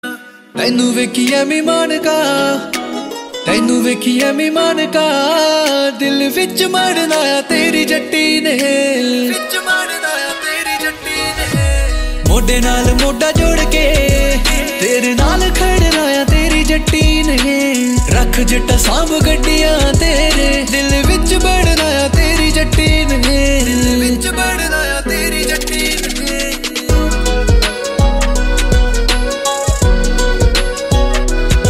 latest punjabi songs

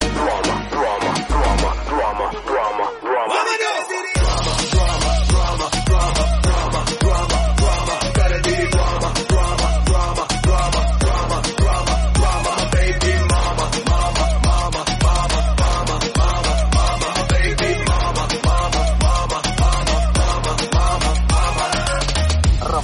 drama instrumental bgm ringtone

